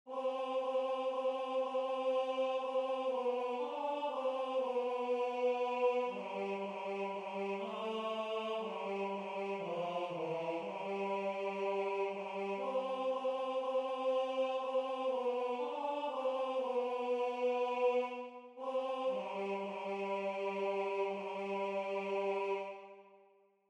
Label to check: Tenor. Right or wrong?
Right